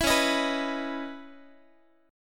Db Minor 9th, Guitar; strummed; 19,21,18,21,x,x; 4A,4E,5A,5D,5G#,6C#; Dbm9

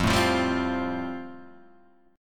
F Major Add 9th, Fadd9 chord; Fadd9 strummed; JGuitar

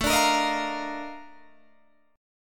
A sharp Major 11th A

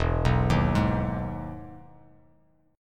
E Major 7th E down; EM7 arpeggiated; JGuitar

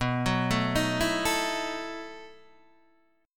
B 11th B Guitar DADGAD, chord

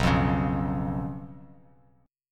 B Suspended 2nd Flat 5th B, Bass Standard; Bsus2b5 strummed; x,2,3,6; JGuitar

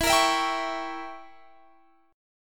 E Diminished 7th E, Guitar Standard; strummed; capo 9

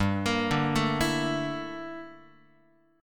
G Minor 6th Add 9th G down Guitar; Gm6add9; arpeggiated; x,3,1,0,1,3

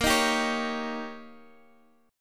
D sharp 7th A sharp, guitar

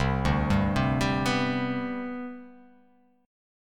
C Major 7th Suspended 2nd C down, chord; CM7sus2 arpeggiated; JGuitar

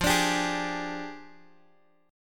F Minor 6th Add 9th Guitar Open F, chord; Fm6add9; strummed; x,12,14,14,11,x

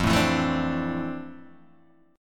F Major 13th Guitar Open D

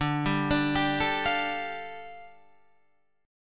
G 7th Suspended 2nd

